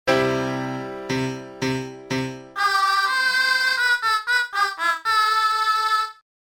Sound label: Singing; Mahou Gakuen Lunar; Saturn